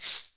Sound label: FX, Drums